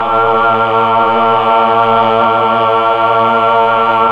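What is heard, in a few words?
voice Mellotron choir; Roland LCDP09 Keys of the 60s and 70s 1; Melotron Vox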